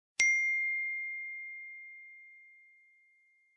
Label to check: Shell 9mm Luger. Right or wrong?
Wrong